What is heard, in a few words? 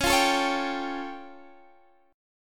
A Augmented 7th Db